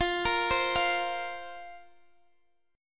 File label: F Suspended 4th F down Guitar Open G